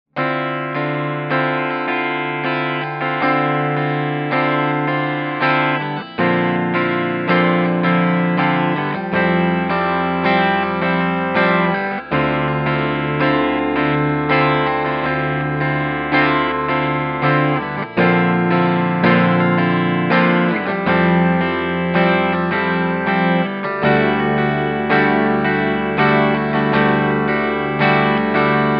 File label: Terror Clean chords Natural channel Tele